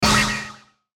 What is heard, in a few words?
button burst warble; Multimedia; Buttons